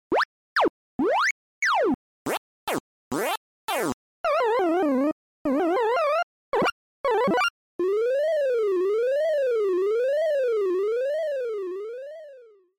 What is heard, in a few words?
Arcade General